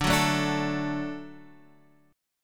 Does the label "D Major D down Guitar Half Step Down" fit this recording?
Yes